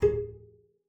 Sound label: G#4, bass pizz; Xsample VOL-5